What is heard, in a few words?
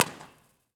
Convocation Mall, Impulse Response; EchoThief Impulse Response Library